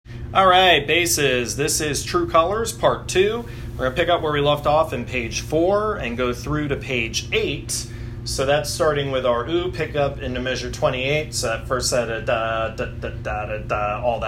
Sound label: Bass